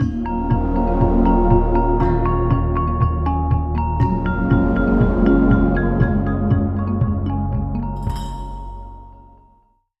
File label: Drums